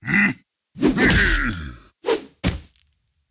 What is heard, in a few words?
Cyclops Attack; Puzzle Chronicles; PS3; PSP; X360